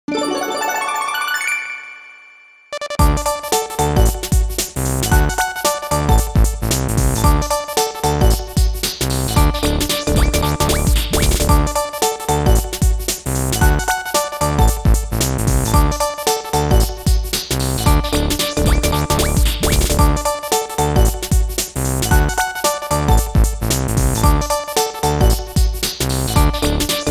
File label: Battle Mode - Round Win